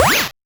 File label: Noise A, 8-bit, Bitty Noises; musicradar; 8-bit bonanza